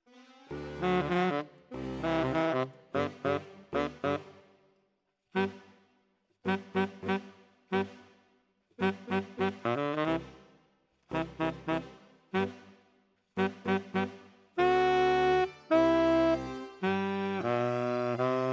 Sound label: Tenor, RNR for piano